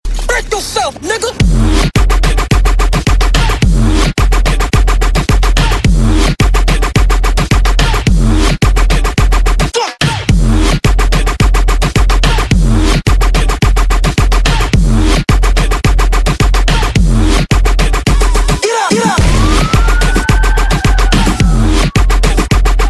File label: Explode Moombah